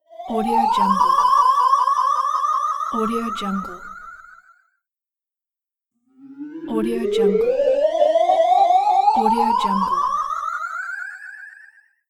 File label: pixel fast move